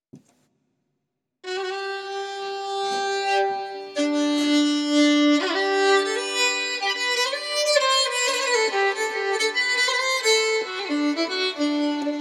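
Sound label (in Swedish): Brudmarsch, säckpipa; efter Axel Inge Gåsborn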